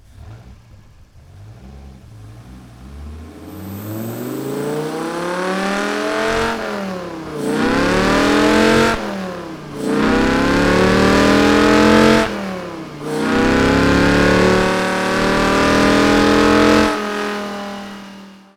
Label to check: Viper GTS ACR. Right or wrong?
Right